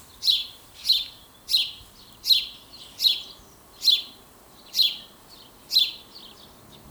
house sparrow call; House sparrow (Wróbel)

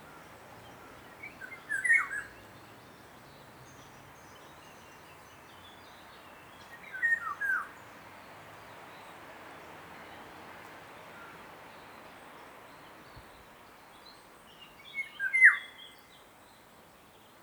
golden oriole song, near a river; Recorded on 2025-05-15 near a river

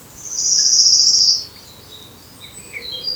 wood warbler song; Wood warbler (Świstunka leśna)

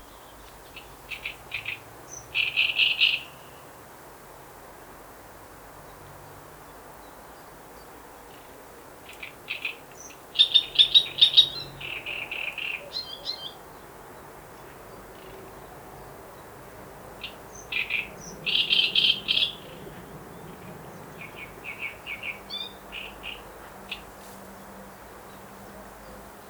great reed warbler song, Heard near a pond.; Great reed warbler (Trzciniak)

